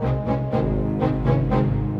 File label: Orchestra; Sonic Foundry (Sony Creative Software); Orchestral VOL-2; Modern 11